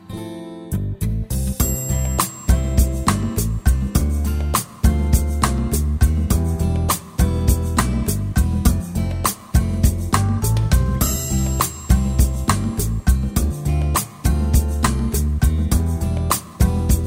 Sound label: accompaniment